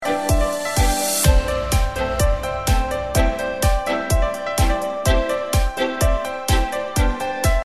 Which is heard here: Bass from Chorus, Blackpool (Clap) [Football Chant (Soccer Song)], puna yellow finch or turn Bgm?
turn Bgm